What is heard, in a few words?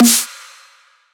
dubstep club snare